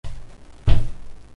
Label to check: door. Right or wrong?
Right